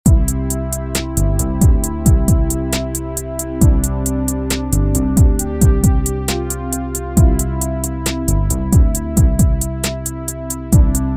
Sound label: Backing Track; Mother3